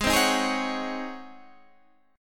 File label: Db Major 7th Suspended 2nd Suspended 4th, Ab, guitar